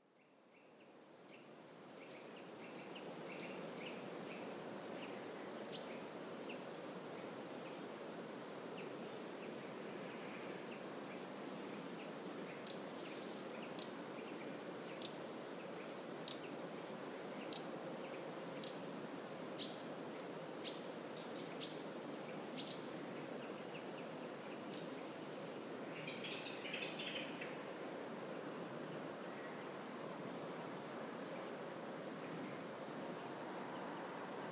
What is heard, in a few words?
Winter Woodland Soundscape